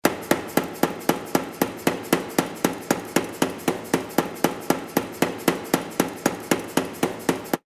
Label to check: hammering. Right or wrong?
Right